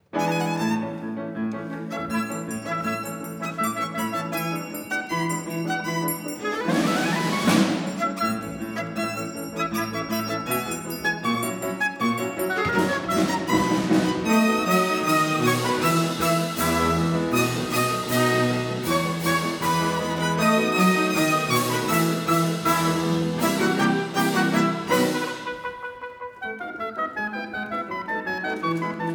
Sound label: polka; trisch-trasch; j strauss